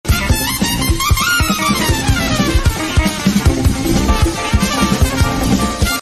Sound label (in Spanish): jazz improvisado